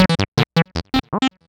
Arp, Keyboards

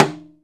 MUTED RIM, Timbale; Timbale Battery

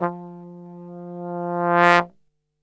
F 1, Brass; Best Service; Brass Super Section

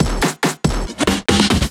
Fills, Loops 140 BPM

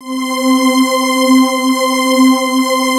SYN STR C5, Analog Fantasies; USB Soundscan vol.49; AKAI; YAMAHA

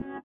SITAR; Spectrasonics; Metamorphosis; INDIATEK

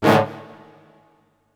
Horn Stab

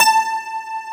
DIDI, SYNTHESIZER, DIGI WAVE

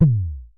Synare Filter; Star Instruments Synare 3

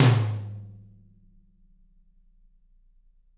TT, Toms; Bob Clearmountain Drums I

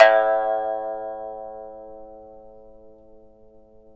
PIPA A1; INIS; Opium

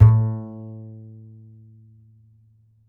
A, Upright Acoustic Bass

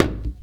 BIG TAMA HIT; Best Service; World Colours

